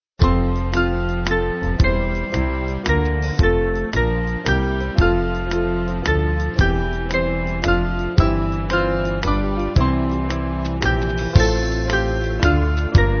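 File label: Band, Music Backing Files for Churches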